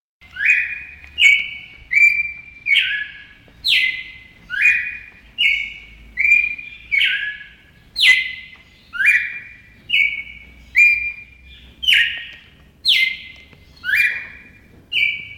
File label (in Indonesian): suara kicauan burung red-crested cardinal variasi